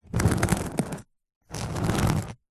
small sharp spike scratch carpet; foley

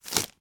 household cd or dvd slide into paper wallet

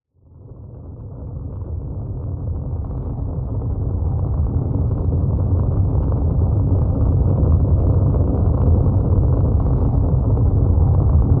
sound design lfe rumble landslide earthquake etc; zapsplat

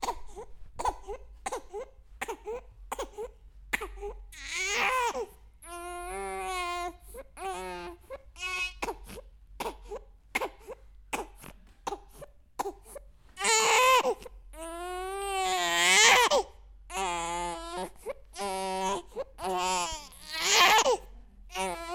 human baby newborn crying

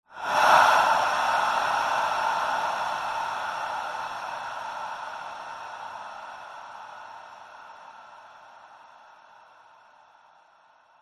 horror breath sinister evil large reverb; zapsplat

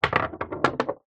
foley stone roll wood floor; zapsplat

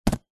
sport rugby ball catch; zapsplat

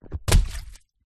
leisure rubber ring inflated drop into water

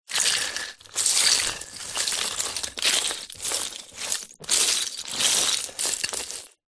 nature ice pieces broken search rumage through

